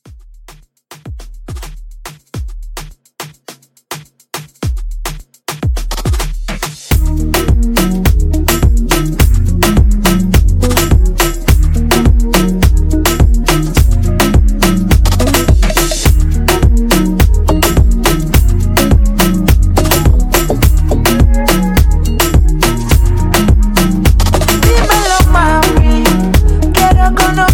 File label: Latin Moomba Re Drum 105